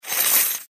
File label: pop score